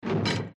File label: metall door; The Witcher Hunt